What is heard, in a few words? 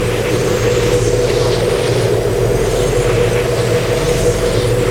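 shrine turret loop; LoL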